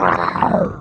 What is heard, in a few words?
DROWN; slith